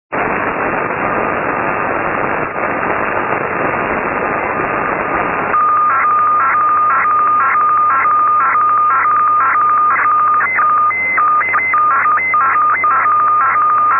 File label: noise fax